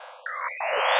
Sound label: wall